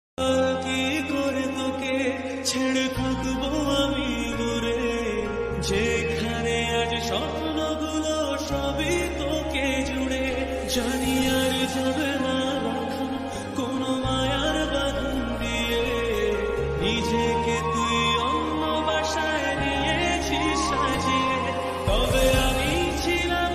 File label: Bengali Ringtone